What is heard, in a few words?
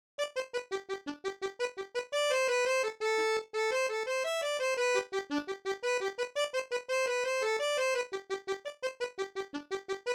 Irish washerwoman jig; swallows nest; kesh jig